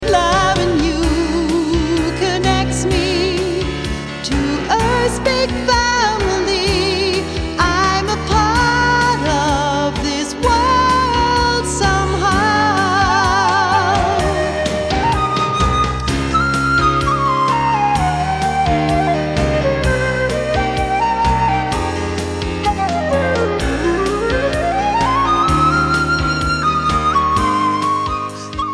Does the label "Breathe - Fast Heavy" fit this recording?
No